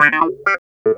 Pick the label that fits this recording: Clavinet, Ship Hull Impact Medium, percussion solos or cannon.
Clavinet